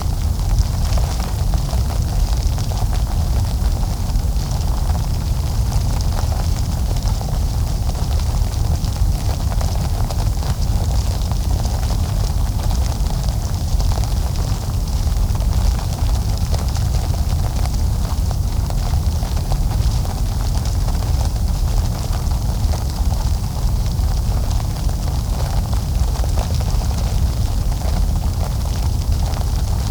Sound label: blazing fire